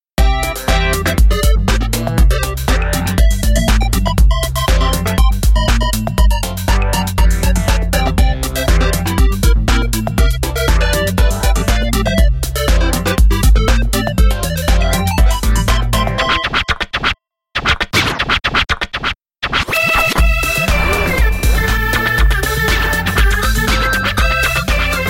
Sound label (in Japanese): スクラッチ音を用いた曲; bgm-edm